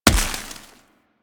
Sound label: Snow Ball Impact; Warframe